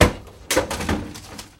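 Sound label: clang of metal during car repair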